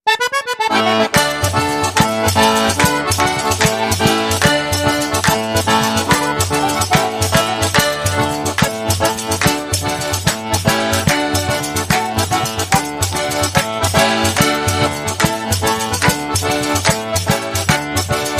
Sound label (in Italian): Tarantella Calabrese, Inst